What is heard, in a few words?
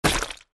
Ink Splat